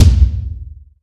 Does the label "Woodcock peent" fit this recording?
No